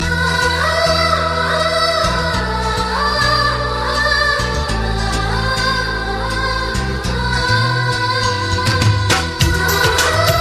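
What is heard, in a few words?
Tamil background music ringtone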